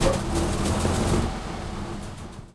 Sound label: interior engine switch, engines tanks; War Thunder